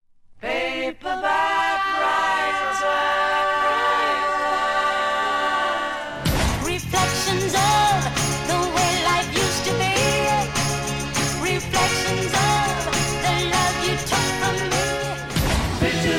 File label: Retro